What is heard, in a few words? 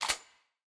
roulette bet